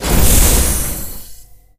lift start; video games; doomsday; source engine; tf

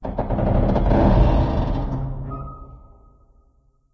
metal randoms, ambience; source engine; portal2

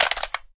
rifle clip out; weapons; rifle desert; source engine; left4dead2

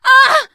hurt critical, teen girl, survivor voice; source engine; left 4 dead 2

